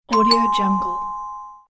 door bell

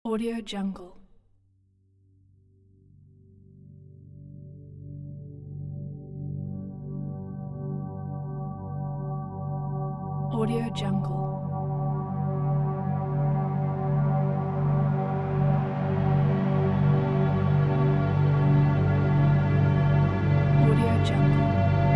phantom ambient drone soundscape; murmur-studio

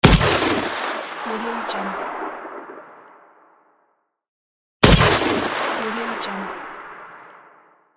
rifle; audiomechanica2

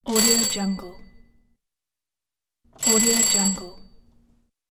door bell

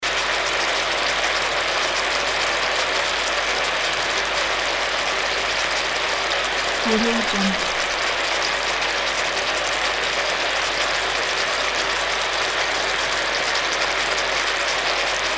frying in a pan

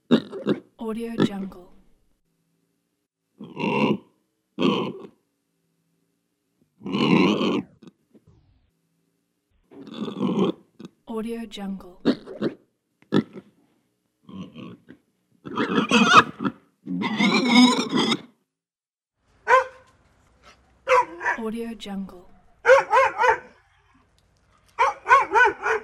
animals village grand pack

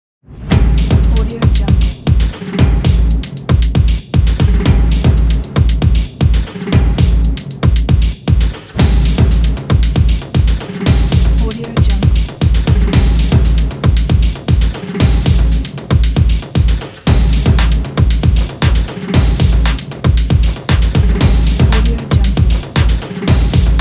stomping modern; anderington

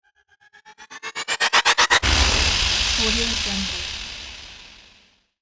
cinematic impact

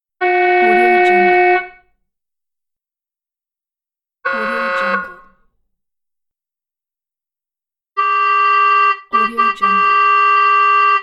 honkers; productionelements

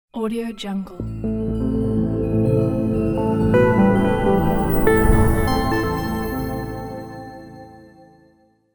short news arp stinger

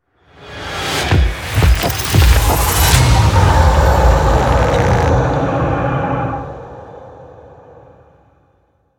scary monster halloween horror intro; silvermustang

